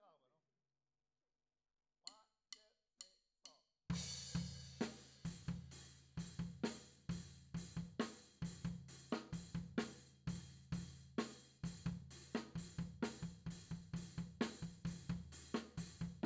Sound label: Floor